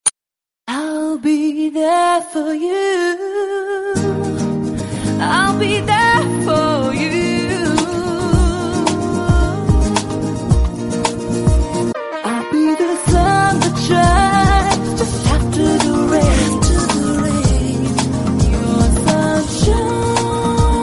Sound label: lovely tone ringtone